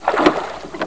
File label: Row